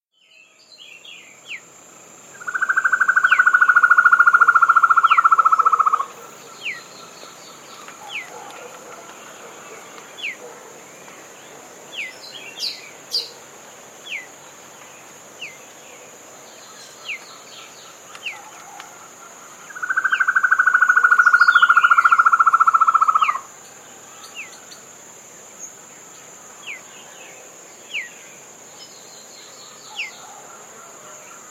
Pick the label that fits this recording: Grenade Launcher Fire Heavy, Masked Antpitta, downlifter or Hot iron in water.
Masked Antpitta